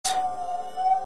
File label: Magic Wand